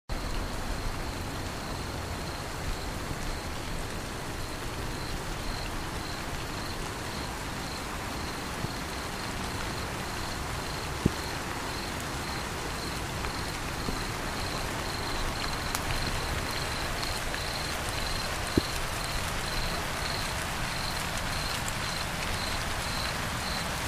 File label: crickets in the rain, ambient sounds